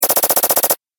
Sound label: Robot Hand Drill, sci-fi robot sound effect; Robot Sound Effects